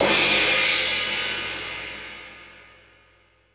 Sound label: GONG POW; Crypton - DrumBase5000